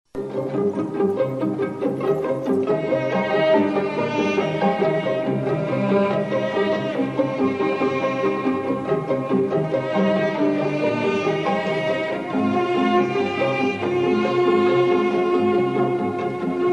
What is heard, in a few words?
Ringtone